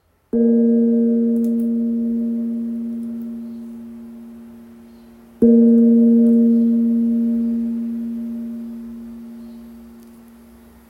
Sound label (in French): Gong birman La diese 800g